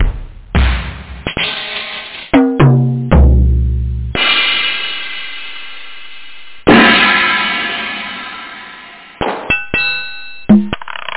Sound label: Dru; Drum Kit 1